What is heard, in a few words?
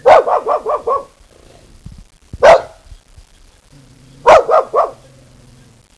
Bark